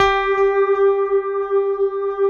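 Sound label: synthesizer, digital; Sound & Vision - Gigapack I CD 2 (Roland)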